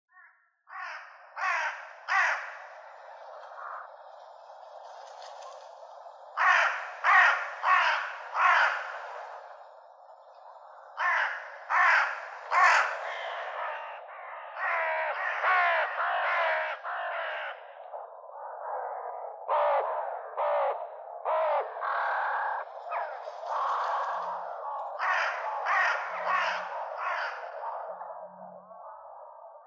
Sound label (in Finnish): varis